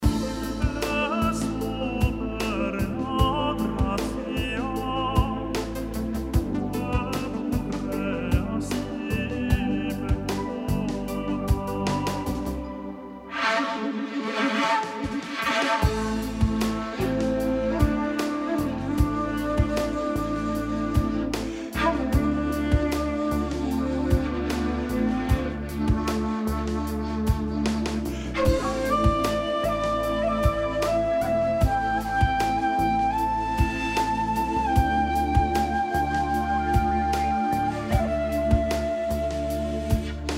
roughmix with shakuhachi

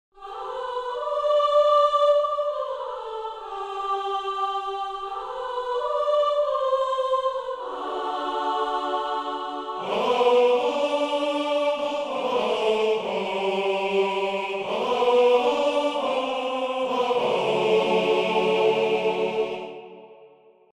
ahoh, Choir; Strezov Choir Essentials

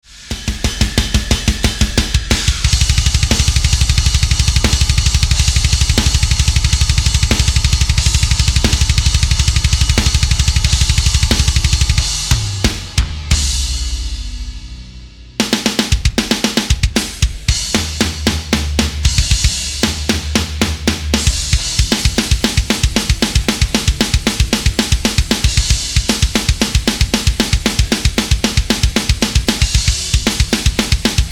sample drums; ez drummer

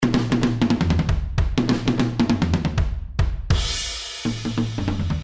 drumending; Bombastisches Ende (L-R-l-r); Cubase

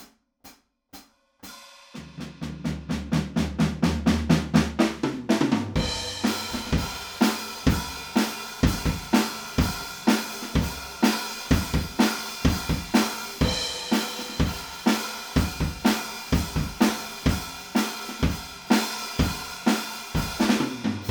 drumroom test; tbone billo baendchen mics

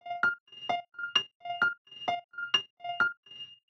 piano vol

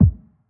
kick

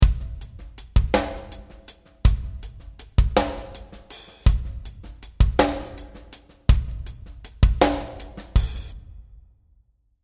oxford

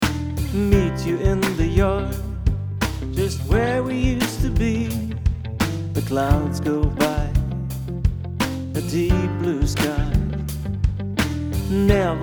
summit, preamp hoervergleich; Summit Audio 2BA-221

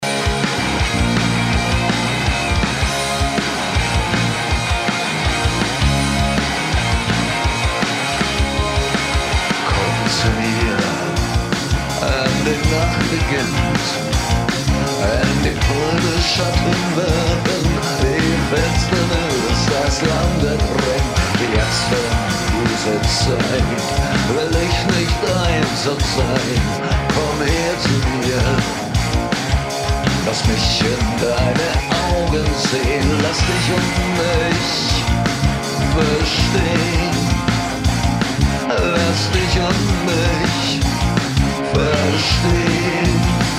mit bass

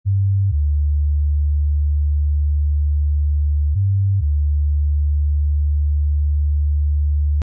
bass

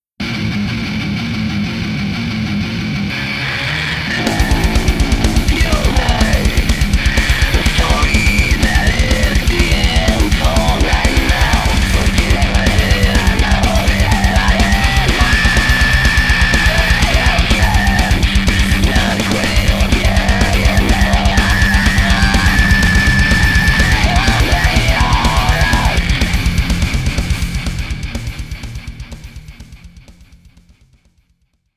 death metal; gemixt und gemastert; hofa xmas contest 2021-22

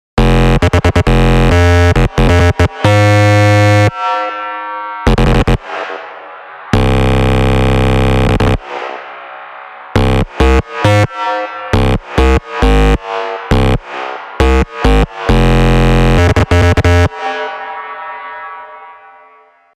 knarz

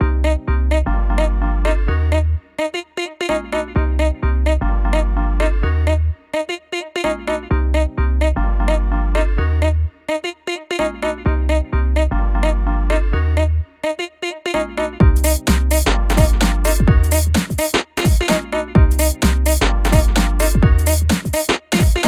clean; xing can opener vs abbey road studio 3 vs audeze reveal plugin

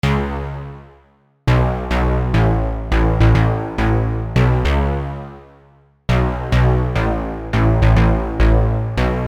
pluck, Pluck Synth

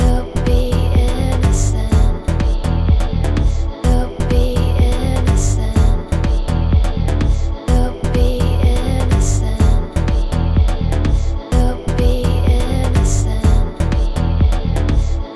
bass

